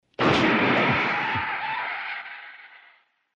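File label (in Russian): лопнувшая шина во время движения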